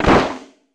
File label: hard drums; 90 sSampleCDs; Crypton - DrumBase5000